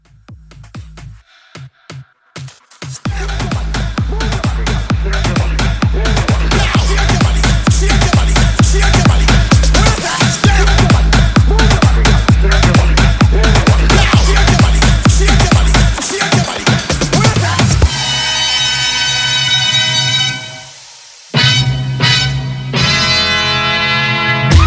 EDM, 130

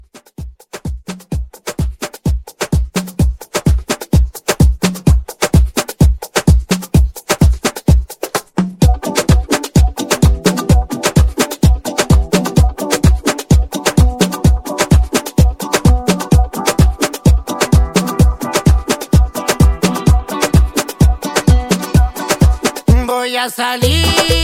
Latin Mixshow Edit 128

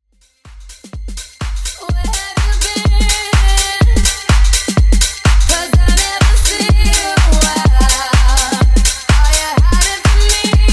House Re Drum 125bpm

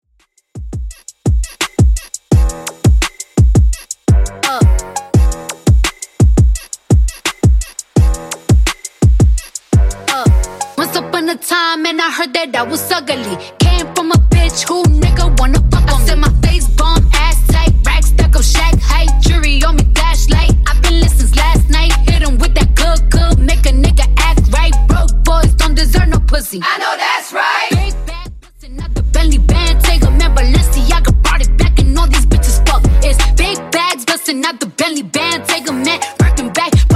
Top40 DJ Friendly 85bpm

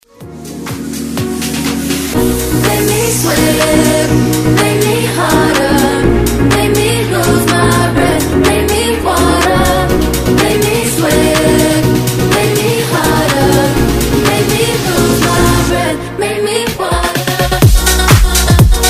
Dance Redrum

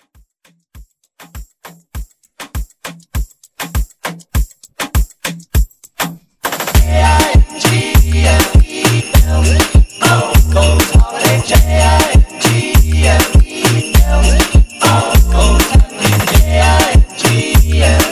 Xmas Moombah Backtrack; Jingle Bells; Xmas Moombah Edit

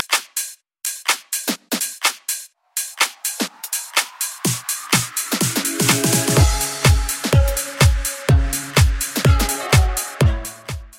House RMX Quick Mix 125 Bpm

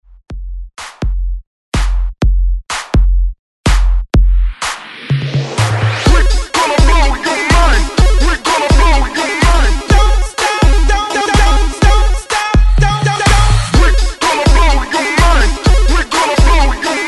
Hype 125Bpm

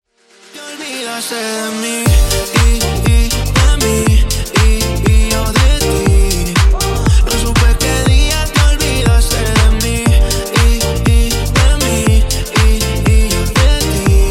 Intro Outro 120 Bpm

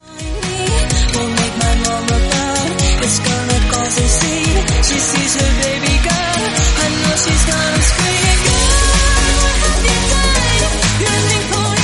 Club Disco, 127bpm, F

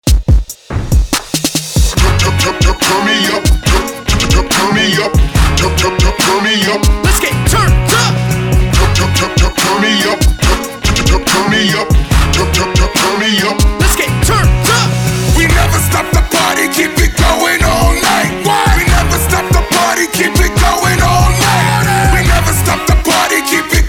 Country Hype ReDrum

